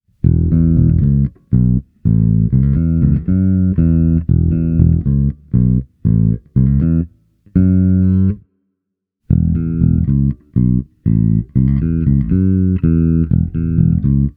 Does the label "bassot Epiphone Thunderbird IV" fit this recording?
Yes